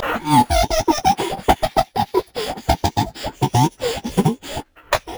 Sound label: Evil Laff; Zero-G; Soundclash Nu Dance Electro; Acidized Wavs; FX Atmospheres